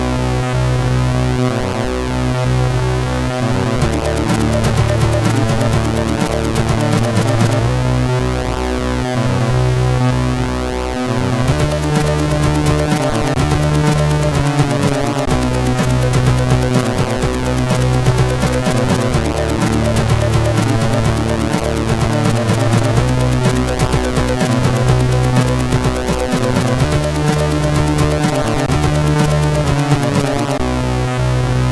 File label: Game music, sid MOS6581R4